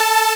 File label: SYN Synth; Best Service Gigapack 1